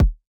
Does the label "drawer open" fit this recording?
No